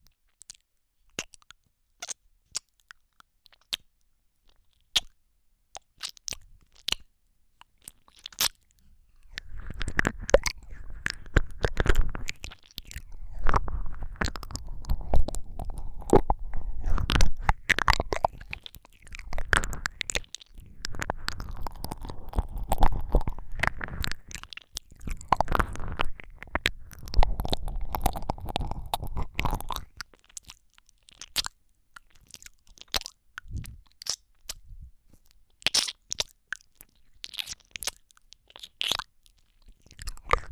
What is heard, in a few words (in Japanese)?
キス＆耳舐め, ASMR